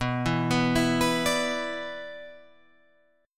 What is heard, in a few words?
B-Minor Double Flat 5th, Guitar Open G